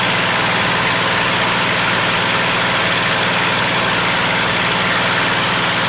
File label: Plane P40 Inflight Loop; Airplanes